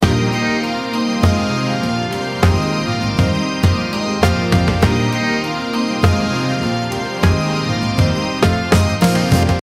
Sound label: wedding music